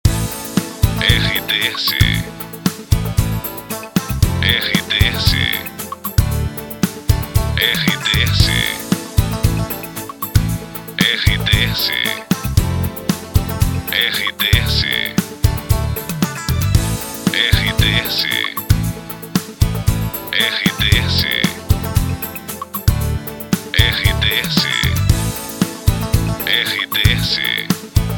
TRILHA PARA LOCUCAO WEB NET POPULAR

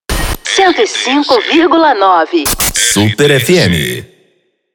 intercom

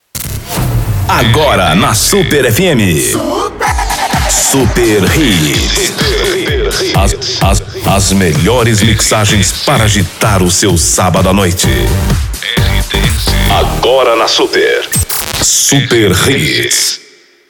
abertura super hits

